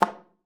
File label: SLPA F3, ALTOSAX; Xsample VOL-2